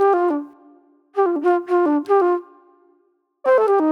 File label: Movie Flute